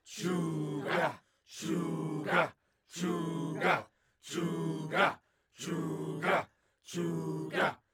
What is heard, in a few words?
Human Crowd Chanting Tribal Slow